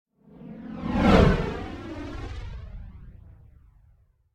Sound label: Whoosh Space Passing Phased; Hollywood Edge; sound designer's toolkit v2